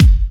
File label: Hard Kicks; Vengeance Samples